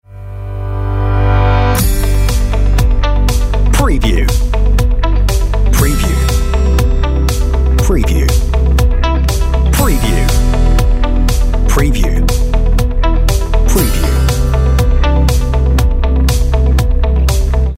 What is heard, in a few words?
On Hold Music Great Heights